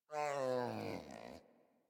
Pet Yawn; Enemies; Warframe; Catbrow; PetShip

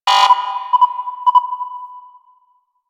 Moa Spawn Fail; Warframe; Quests; The New War; Veso

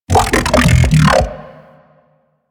Ambient Vocal; Nechro Mech

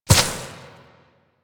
Ram Movement Start; Animals; DE; Warframe; Quests; Duviri

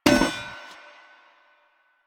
Street Sign Destroy; Warframe; Ambience; Nineteen Ninety Nine

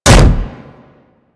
Contact Hit Effect; Vehicles; Archwing

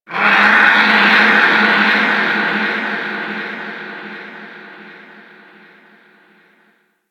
Outpost Creature, Ambience

